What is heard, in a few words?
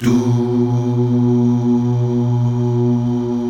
Mal Duh; Best Service Gigapack 1